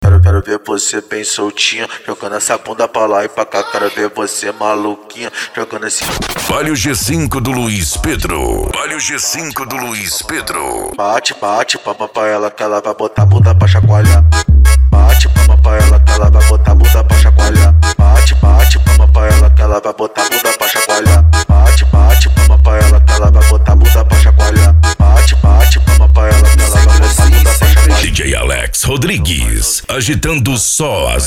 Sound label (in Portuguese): funk mega bass e forro; urupa ro